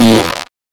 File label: Fart